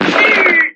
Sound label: American football